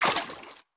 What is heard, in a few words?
FS Water Deep Wade, footsteps